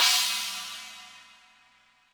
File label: HiHat & Crash, Roland TD-20; HiHats